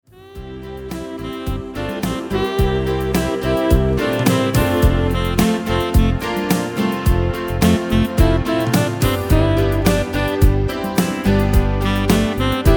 Sad Songs, Tyros 5

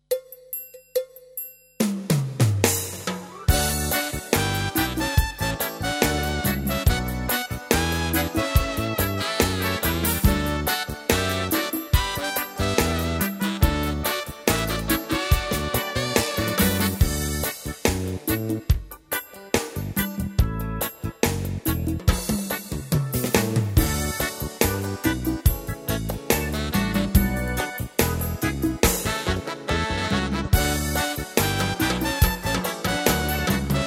Jamaican Christmas, Tyros 2

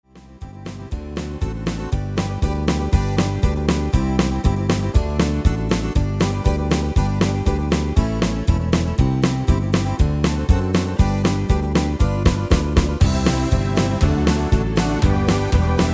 Boom Polka, tyros2; Dansk GrandPrix

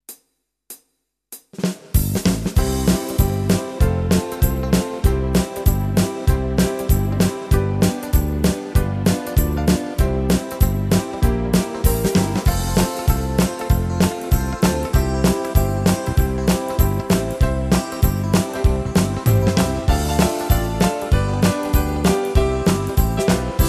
Shuffle, tyros2; Dansktop

